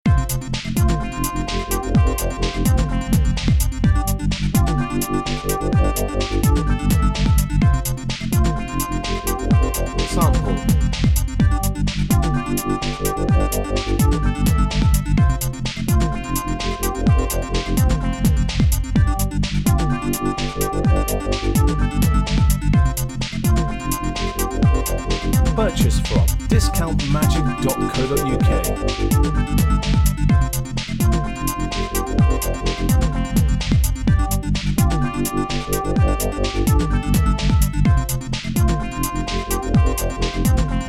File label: electro, backing